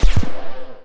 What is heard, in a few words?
boost